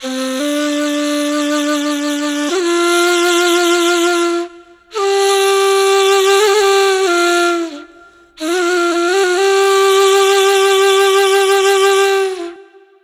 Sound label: Ney, Wind Instruments